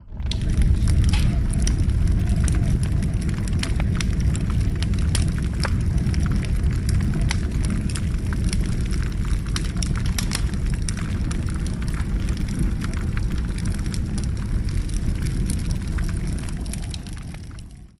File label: Large Fire; SoundsCrate